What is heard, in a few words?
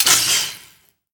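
Ui Button Forward; Angry Birds Star Wars 2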